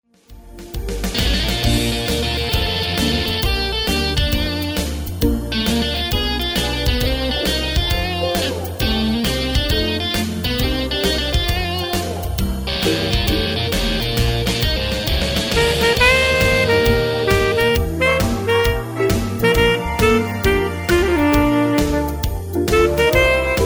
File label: jingle rock